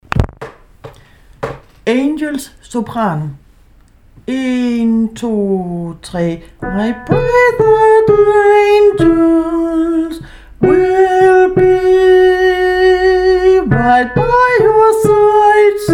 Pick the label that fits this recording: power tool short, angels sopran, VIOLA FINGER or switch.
angels sopran